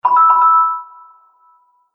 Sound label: Collect Coins Medium Speed, video game sound effects